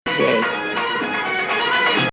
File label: Bayati Nahawand